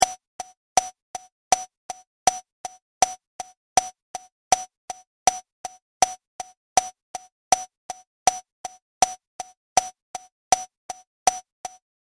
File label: Click Sample